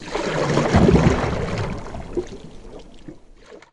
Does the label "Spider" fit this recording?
No